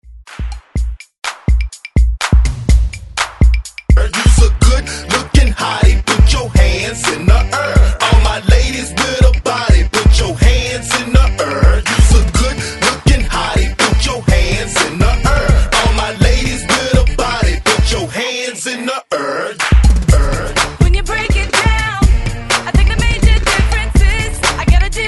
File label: Hype Re Drum 124Bpm